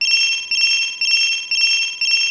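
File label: keitai echo, mobile phone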